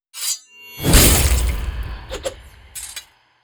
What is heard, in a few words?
damage taken; yami